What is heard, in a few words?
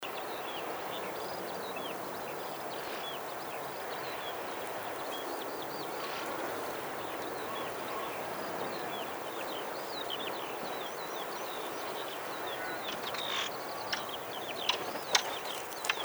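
ring ouzel torquatus, flight call; mounthooly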